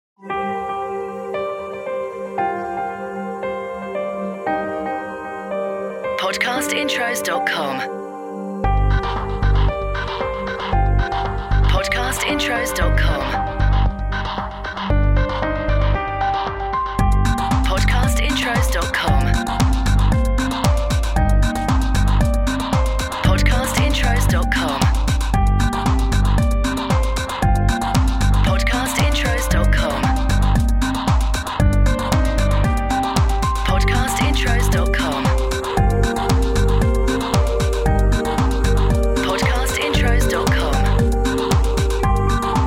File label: BREAKBEAT; Podcast Watermark